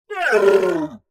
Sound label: MONSTER Yeti cry